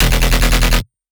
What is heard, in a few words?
Bass E; Dubstep; Bass One Shots; Bass E Syndrome; Ghosthack; Dubstep Sound Arsenal; 50 Bass One Shots